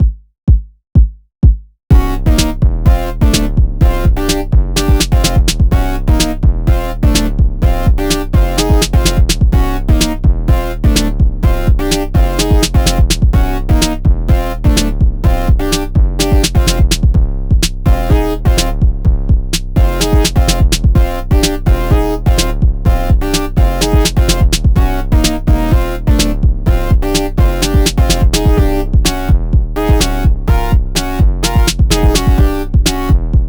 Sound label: instrumental